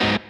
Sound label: G#m7 Staccato